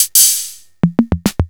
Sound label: Casio PT-68